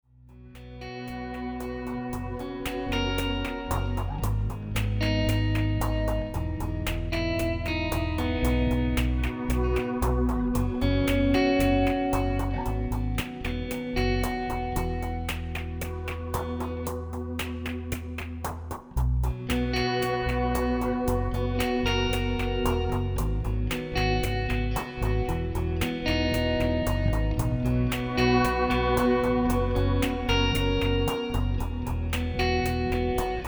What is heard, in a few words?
rhythm